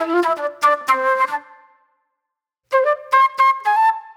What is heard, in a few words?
Flute, Disco